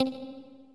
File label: hover, Old TalkBack Sounds